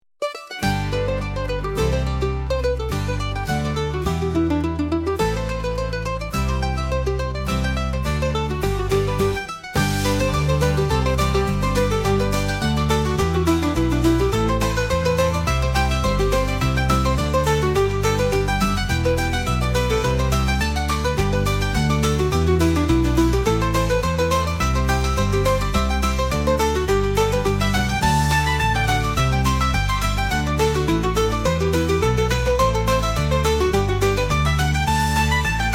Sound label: highland serenade, volinki